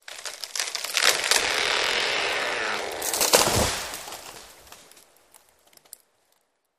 Tree Large Wood Stre

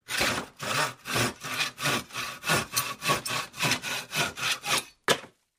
handsaw sawing

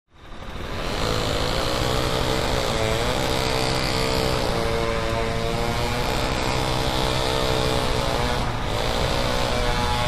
Chain Saw Construction

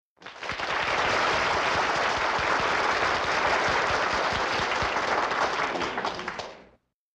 Applause Sml Theater

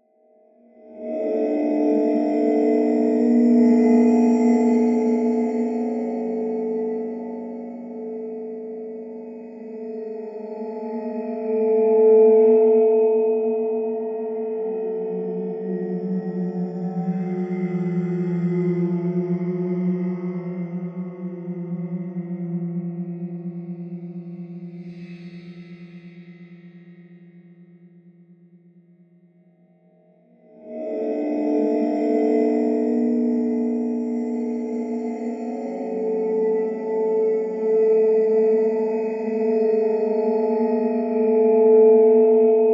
Sci Fi Atmos Vowel, ambience

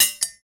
Bullet Shell On Stee, shell casings fall

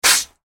Water Blasts From Fir, sprays & hoses

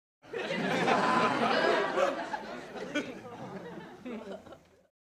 Crowd Short Burst, small int

